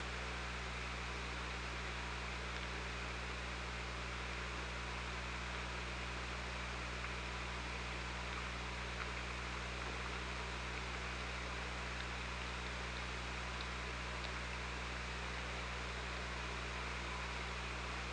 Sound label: OrcaLab